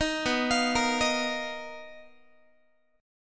B Major Sharp 11th, Guitar, 4A,4E,5A,5D,5G sharp,6C sharp; arpeggiated